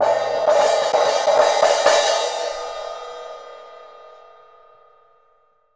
Cymbal Fill; Essential Percussion; Ride Cymbal Patterns

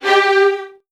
G2, VN 16TH, Virtuoso Strings; ILIO; Kirk Hunter

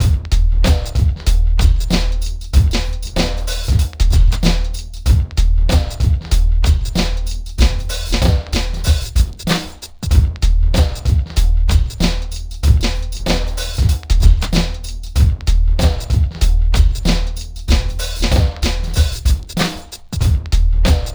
HIPHOP, Funky Xtreams; INIS

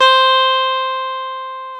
GTR, Dual Guitar; Club-50 - Foundations Roland

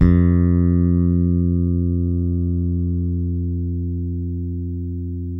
soul F 3, deep bass; Giga Samples Collection

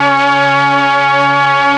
STRING; ILIO; Propeller Island Legendary M400

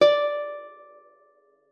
D5, guitar; Xsample VOL-2

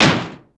Door doing; Total Drum&Bass; 90 s Sample CDs; Hits & Bits

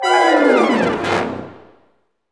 BRS RIP, LOW BRASS; Roland - LA Composer vol. 1

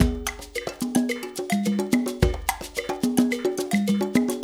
LOOP, Percussion; InVision Interactive; Percussion Slam

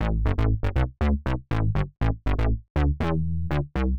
Synth Bass A; PowerFX - Nu Jazz House

